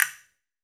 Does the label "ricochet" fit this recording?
No